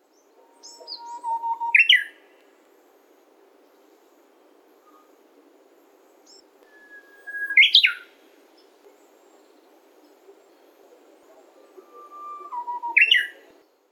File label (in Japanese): uguisu